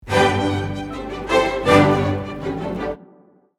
Trepak 2 Layers, Layer 1: Melody on Violin I / • Layer 2: Chords in winds (2 Oboes, 2 clarinets, 4 horns) and the rest of the strings.; Rule of Three; The Nutcracker